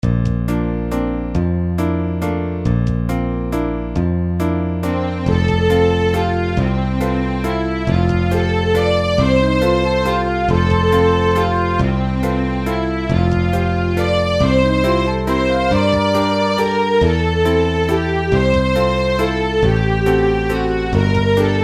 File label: waltz